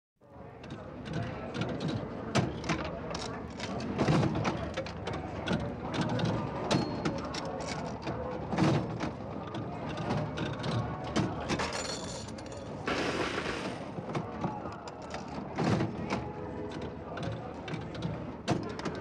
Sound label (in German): glücksspielautomat - gewinnausschüttung; Gluecksspiel